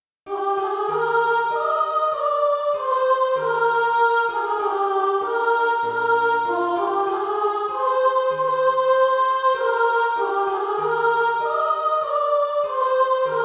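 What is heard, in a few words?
soprano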